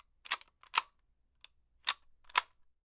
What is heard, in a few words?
Tikka lite bolt load shell